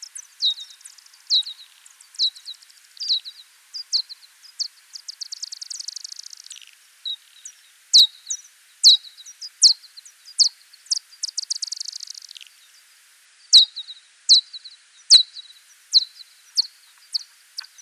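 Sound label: Pipistrellus nathusii, bat; Boehm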